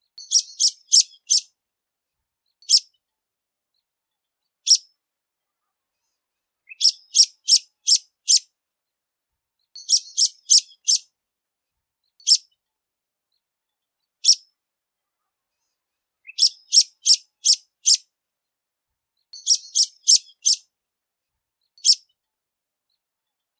Canto de degolado

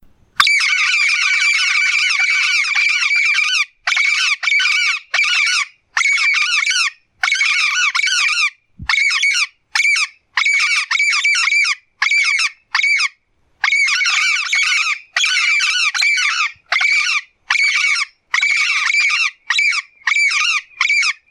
Canto do Papagaio Galego

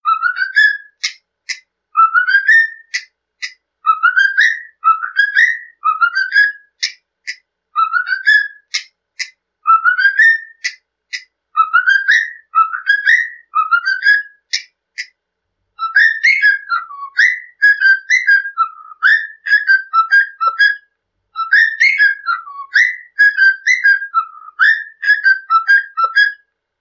Canto de Calopsita

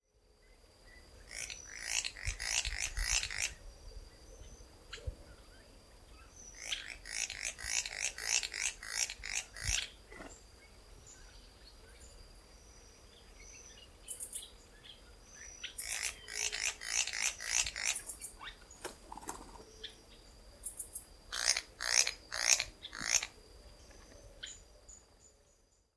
Canto do anambe branco de rabo preto

